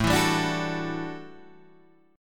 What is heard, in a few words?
A 13th Suspended 4th A, guitar chord; strummed